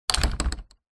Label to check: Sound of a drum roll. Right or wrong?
Wrong